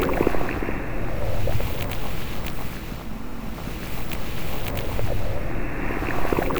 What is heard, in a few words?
loop, techno atmos